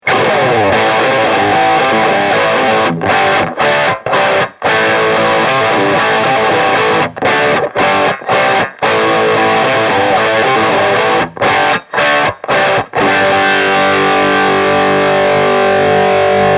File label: Heavy Crunch Full